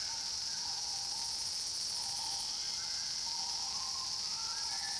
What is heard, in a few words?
amb swamp; naboo; Star Wars Obi-Wan; XBOX